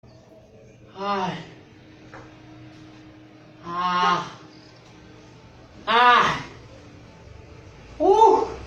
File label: ahhh uhhh